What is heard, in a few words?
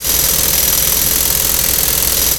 air Hammer; tools Bangs